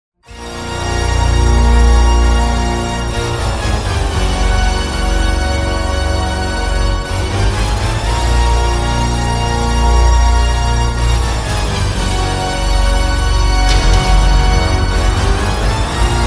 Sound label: opery organ ringtone